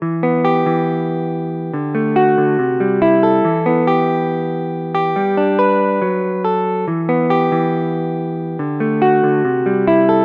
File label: Nostalgic Melody Loop - 140 BPM E Min Chords; Melodies; Loop Stems; Cymatics; Oracle Sample Pack